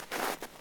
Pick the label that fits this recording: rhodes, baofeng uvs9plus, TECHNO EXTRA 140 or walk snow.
walk snow